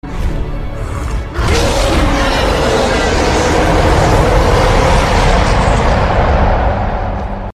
yell sound; Hulk

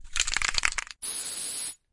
spray shake sound effect

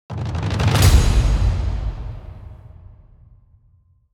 Whoosh Hit

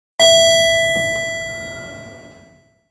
elevator

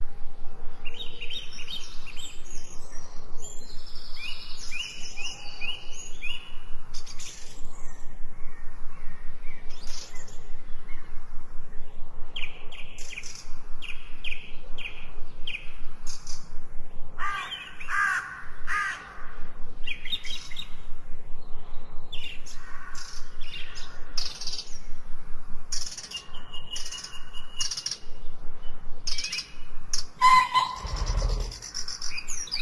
forest birds sound

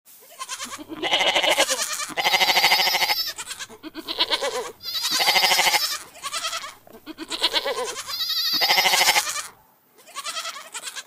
Goats sound; صدای بز

